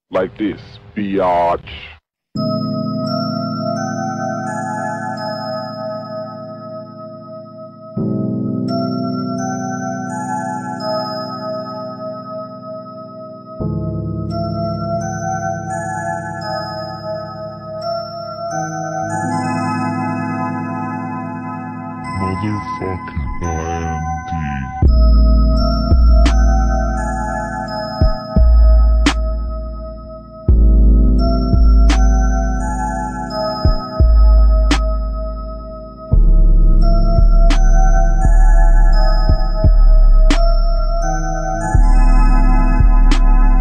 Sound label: instrumental